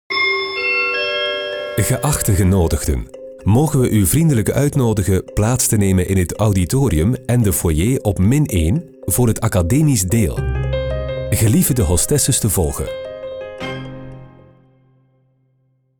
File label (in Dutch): jingle academisch deel bell; studio sonart